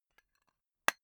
tobacco tin close

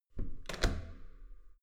metal door heavy handle down soft

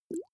small bubbles single soft

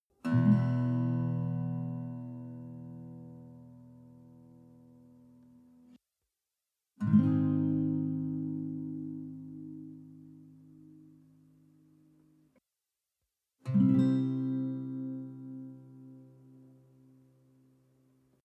western guitar chord full major soft

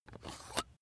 razor battery compartment open

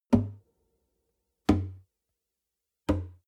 nylon guitar drum single soft

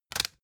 cd in cover

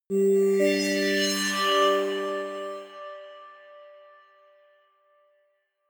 notification air pad

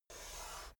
chess piece move

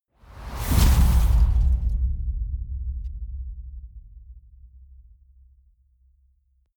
gust hit

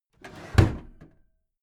packed drawer smooth clatter close heavy halfway

